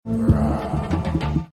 rawr

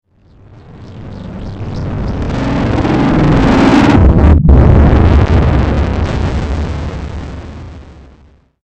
ping ring

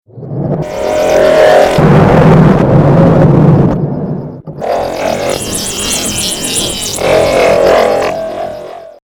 ping ring

